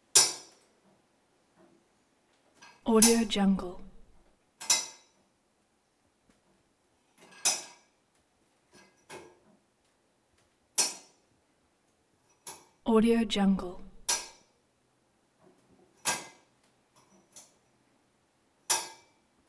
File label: old light switch